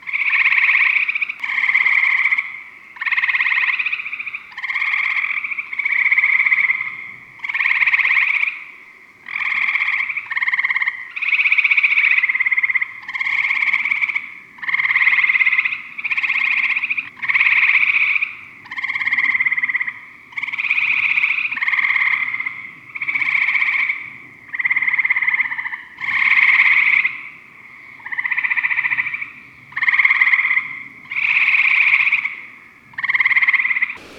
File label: eastern gray treefrog, frog calls; amphibians; terrestrial